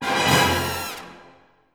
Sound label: Combo Stab